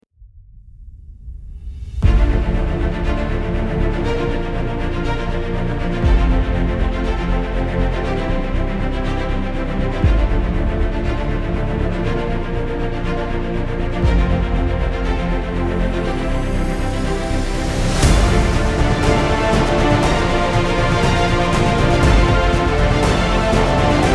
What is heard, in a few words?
Dramatic Adventure